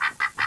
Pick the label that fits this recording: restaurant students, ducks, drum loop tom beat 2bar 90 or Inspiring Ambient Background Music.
ducks